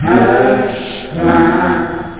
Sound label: vocals; love squares